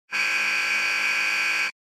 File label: Electromagnetic Buzzer, Dry